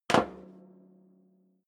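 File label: step sneaker metal rattle stair up, footsteps; schritte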